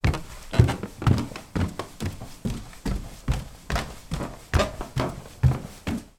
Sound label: human footsteps wooden stairs creaky reverb; sound effects one